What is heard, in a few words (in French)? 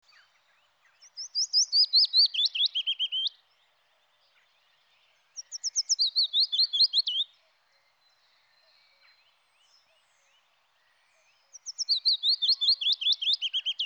pouillot